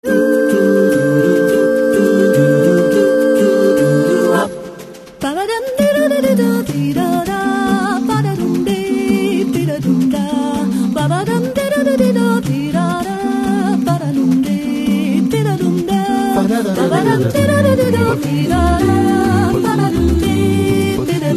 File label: jazz